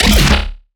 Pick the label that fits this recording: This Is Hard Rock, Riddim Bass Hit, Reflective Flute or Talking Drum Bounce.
Riddim Bass Hit